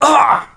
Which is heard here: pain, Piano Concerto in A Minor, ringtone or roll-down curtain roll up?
pain